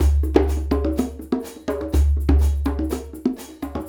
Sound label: Combo, Essential Percussion